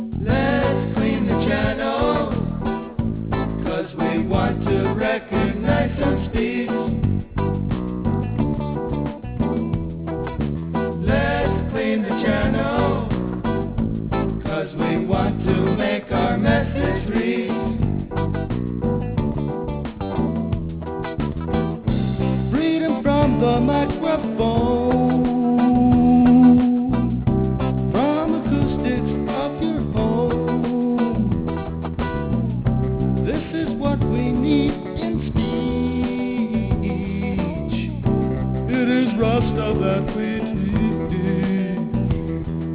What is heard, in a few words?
rasta chorus